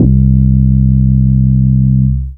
Single Electric Basses, Dance Floor Bass

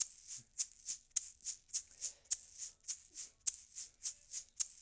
LOOP, SHAKERS, Percussion

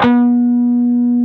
PRS PWR C4C, Guitars; PRS PWR CUTS

